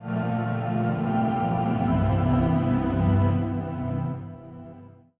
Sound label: Shutdown; Windows Infinity Shutdown; Windows Never Released Soundpack 1